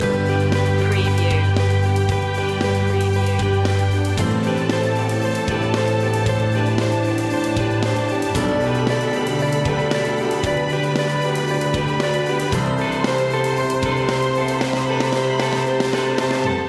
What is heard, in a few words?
a positive world loop